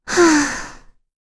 Vox Sigh; Kirze; kingsraid; voices heroes

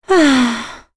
Vox Sigh; Lewsia; kings raid; en

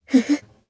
Laugh, jp; Kara; kingsraid

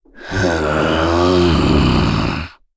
Vox Sad; en; voices; heroes; KaselB; kings raid

